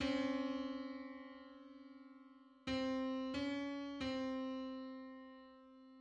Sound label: Five-hundred-fifty-first harmonic on C